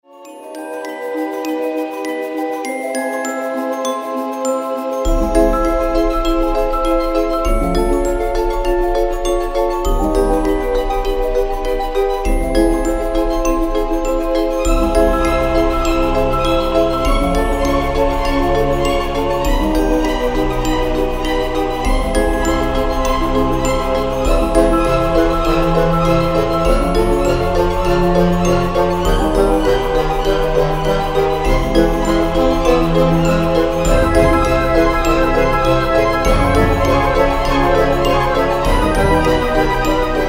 Motorola Magical Ringtone

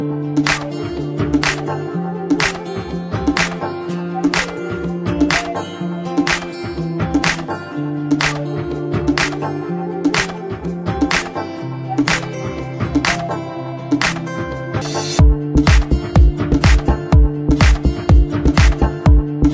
Music Ringtone for Android

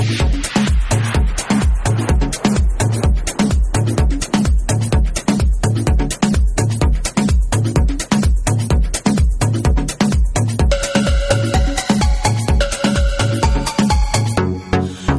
Music Ringtone

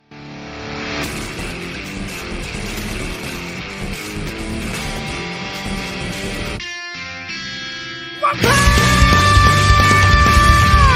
Classical Band

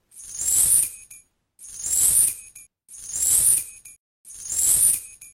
Thrash Metal Ringtones

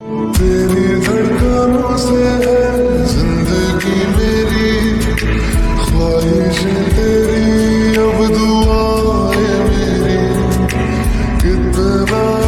Song Sad Ringtone

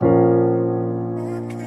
Big Bell Notification Ringtone

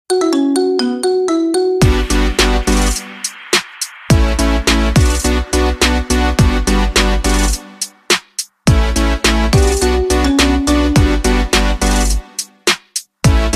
Marimba Remix iPhone XS Ringtone

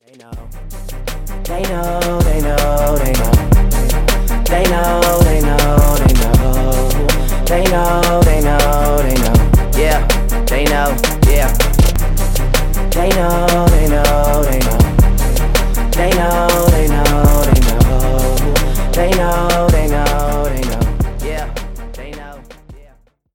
Samsung Galaxy Note 20 Ultra Ringtone

